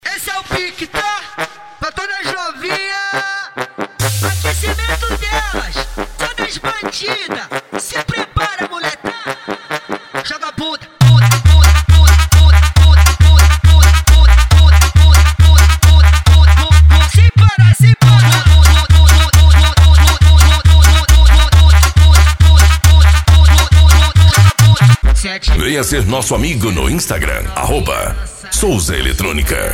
edicao automotivo; eletronica souza

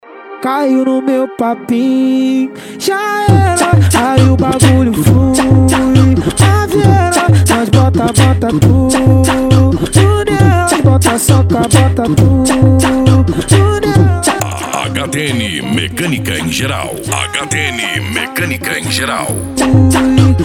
funk e lambadao

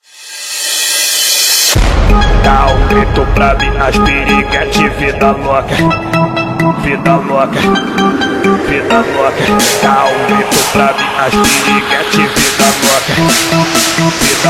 eletro funk

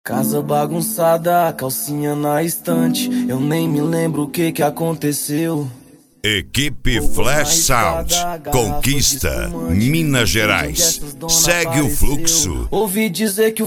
rap; cd rap flash sound

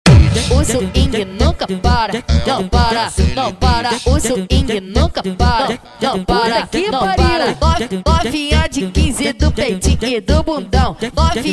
especial funk bass

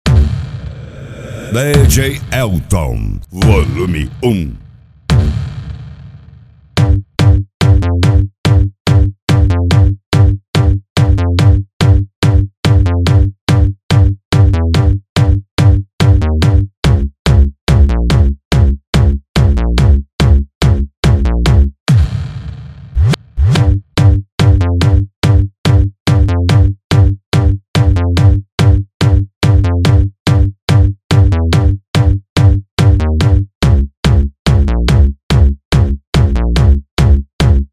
eletro car automotivo

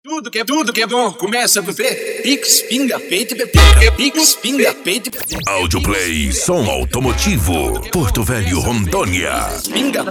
esp funk mega bass

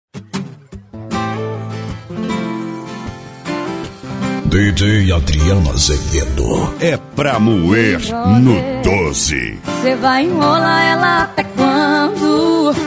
funk e sertanejo

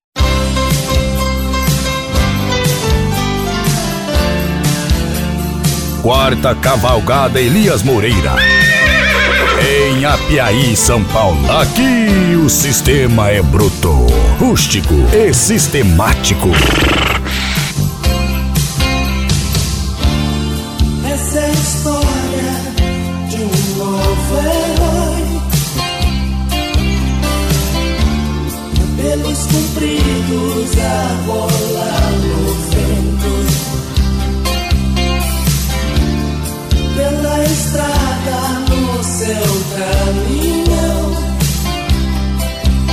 sertanejo classico

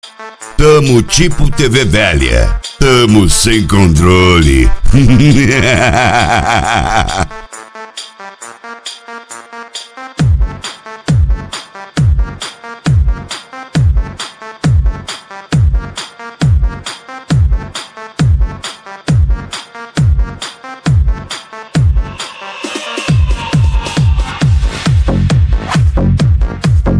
mega funk

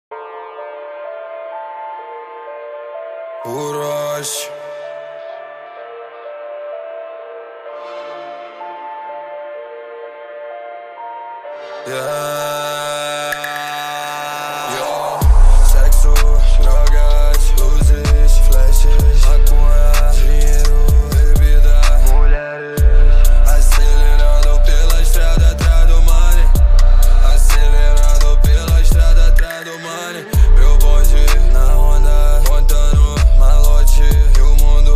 graves bass

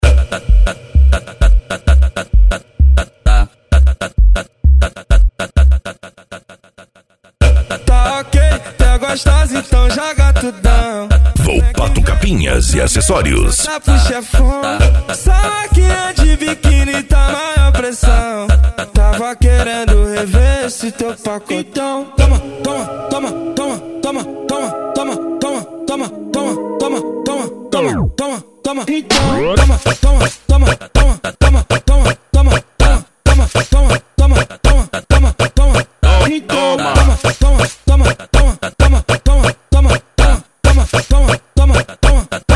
funk consciente e hip hop

